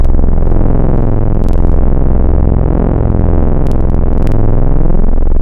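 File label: Chill Table, Wavetables; Synthetic's Serum Collection; Cymatics - Chill Tables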